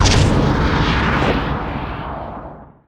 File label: starfall; weapons; dota; mirana